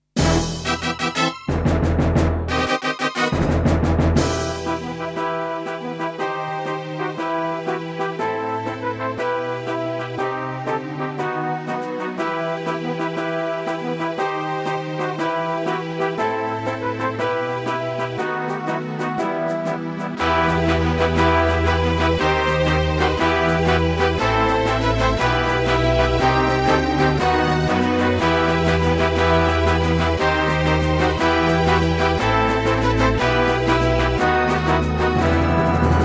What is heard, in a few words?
Terratec WaveSystem SCW001-2; Warcraft II - Human2